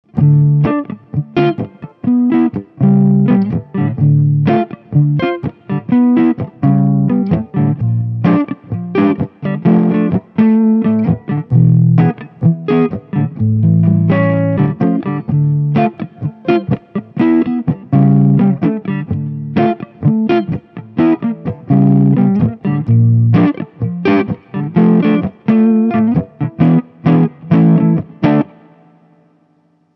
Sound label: Latin fat overdrive